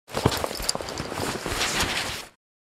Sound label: School bag backpack handling movement; luggage